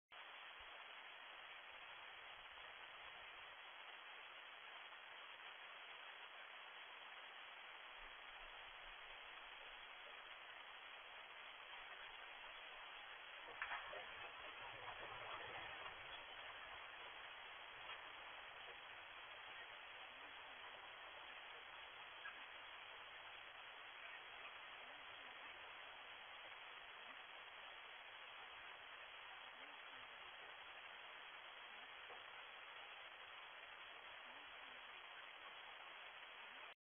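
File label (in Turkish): ineklerin deprem anındaki paniği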